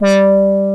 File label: ANALOG COMBO; OMI - Universe of Sounds